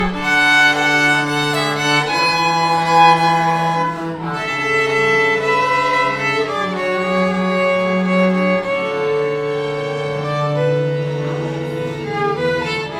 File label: Orchestra performing